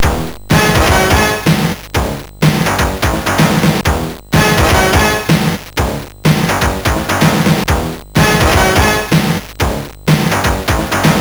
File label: sid CSG8580R5; Starray Digi Demo part 2